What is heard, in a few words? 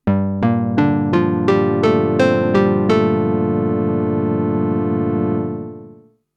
juno 6 jingle, roland juno 6 polyphonic analog synthesizer